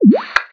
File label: emote in